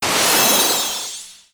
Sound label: Enemy Skill Heal